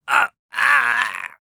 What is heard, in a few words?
VO Death; VIP